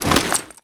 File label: foley step, soldier; combine; soldier common